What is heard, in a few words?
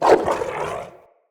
Pack Wolf Attack Bite; Animals